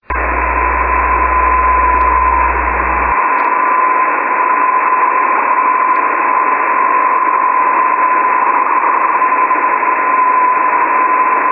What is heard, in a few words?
6m; 49.750; 6 Meter; N1BUG